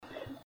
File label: Nat Sound